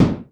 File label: NOIZ KIK